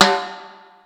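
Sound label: Timbale, Yamaha SY22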